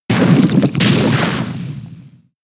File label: Sludge Bomb; Pokemon; gen 6 attack moves; XY ORAS